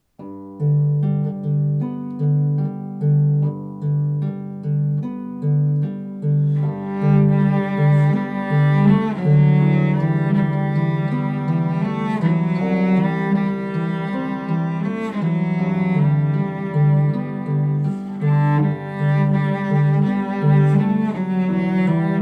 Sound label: cello